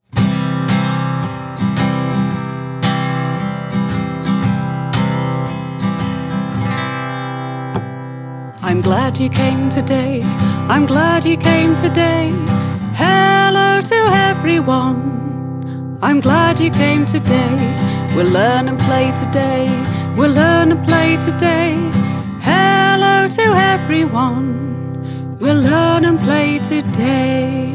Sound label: Greeting Song